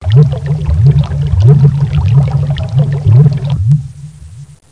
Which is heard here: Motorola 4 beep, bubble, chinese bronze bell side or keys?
bubble